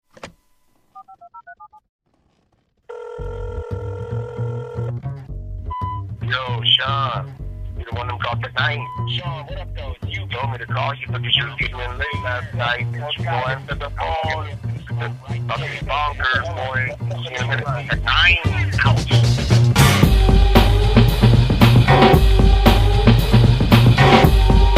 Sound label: Ringtone tech